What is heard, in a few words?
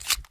elite clip out, weapons; garrysmod